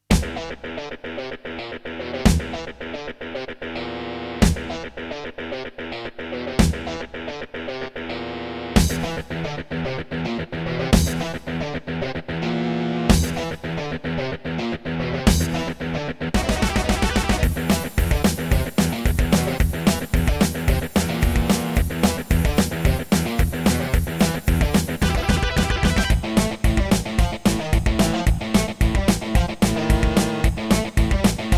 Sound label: NEC XR385 (DB60XG Clone) GSMODE; Doom